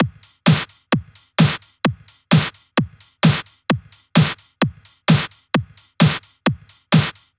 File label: 130BPM Kit; VFH1 130BPM Tibet Kit